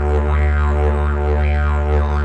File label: Digerido; Best Service; Ethnic Journey Sound Cube